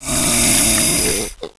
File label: hiss, pupae; svencoop